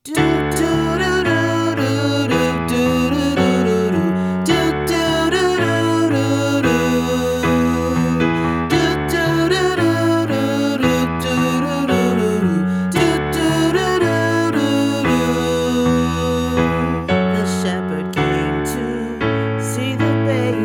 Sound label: Full Choir; 2025 christmas choir